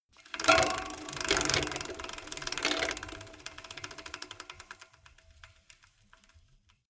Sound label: bmx bike freewheel reverse